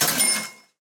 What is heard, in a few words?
clearing